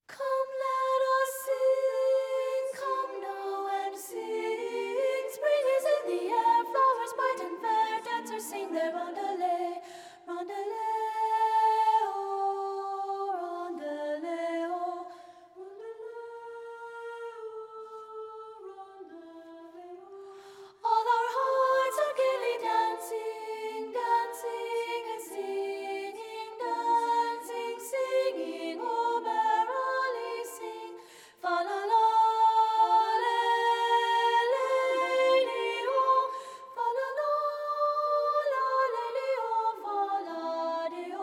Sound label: SOP1